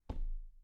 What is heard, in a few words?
Step on wood